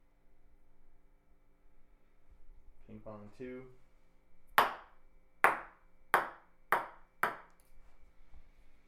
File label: Ping Pong